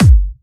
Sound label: Bassdrums UK Trance Kicks; Vengeance Samples